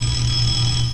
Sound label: Phone; Glider PRO